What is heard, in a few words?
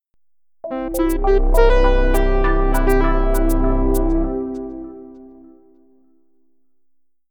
jingle; garageband; Reds